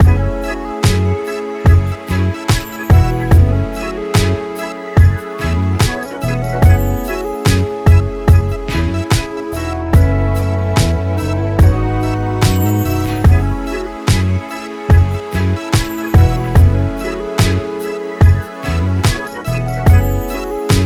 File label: 072.5 F#min, Smooth RnB